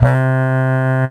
Casio CTK 611 Baritone Sax C3

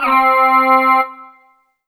Alesis S4 Plus Bag Pipe C4

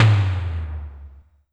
Electronic Tom

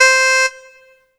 Yamaha TG100 Shanai C5

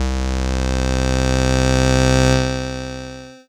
Casio MT-600 Synth Reed C2

